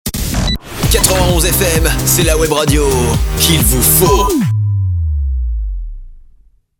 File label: Jingle